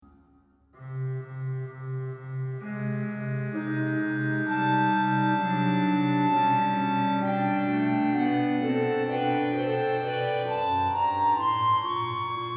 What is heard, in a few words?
Dissonance Quartet 1 6 comma